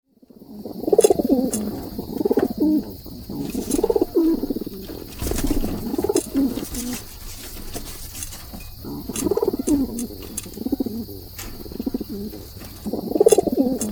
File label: Rock Pigeon; national park service